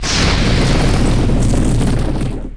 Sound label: sorc cast; DAoC; Toa; Gamestar